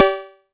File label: Cowbell